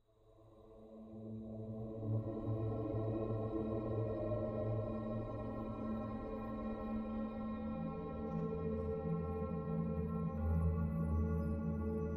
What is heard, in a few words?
workingsound; roomeyes